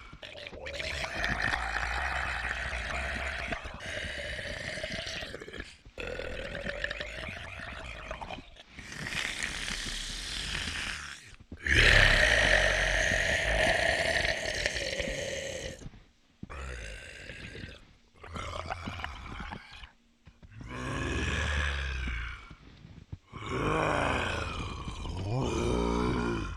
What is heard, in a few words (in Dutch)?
zombie rustig